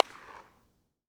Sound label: Reverb in front of abondend hospital; SFX; IRL Recorded; Reverb Enviroments